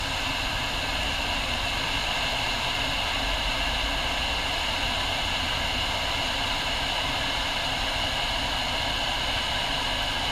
Copper Leak Heard With Listening Disc Under Street Pressure